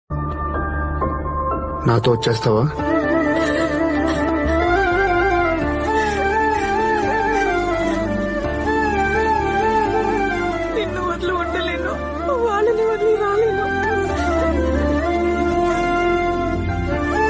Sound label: bgm ringtone; chalo